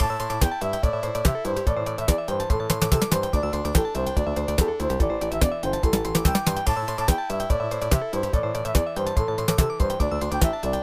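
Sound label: background music; Block Block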